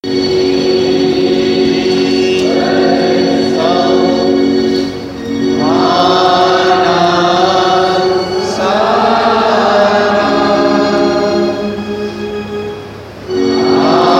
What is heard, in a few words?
Choir, Sunday Worship; 540 He Iswar Sahu Vana Sara; Shalom Methodist Church Choir